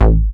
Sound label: attack, BASS, Techno Trance; Techno Trance Essentials